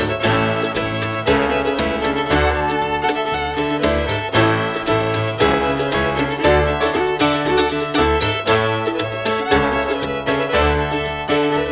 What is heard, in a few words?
trombone a